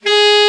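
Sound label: alto, sax; Best Service Gigapack 1; BR SAX 1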